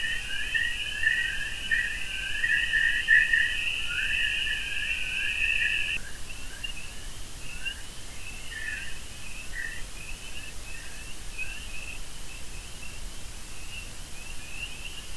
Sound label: WFR audio emfisis